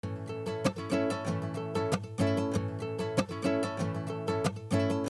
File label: 6/8 bandari